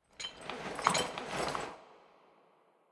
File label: enter neutral phase